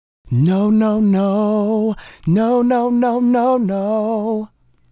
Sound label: Male Solo, Hip-Hop and RnB Vocals